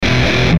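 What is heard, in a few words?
Musical Element, Drum Snare March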